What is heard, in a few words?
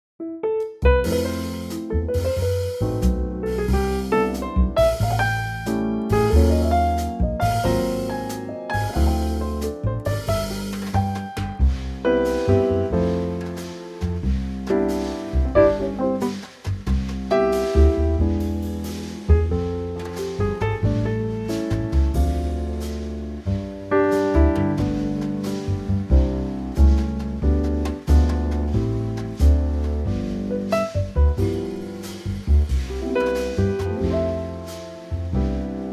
C trio; christmas